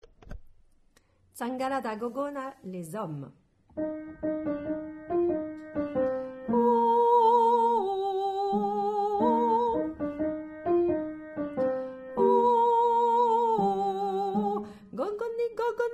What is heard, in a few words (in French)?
Hommes; Tsangala da Gogona